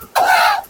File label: mans scream